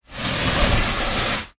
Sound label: foley cardboard box push slide inside box pov; zapsplat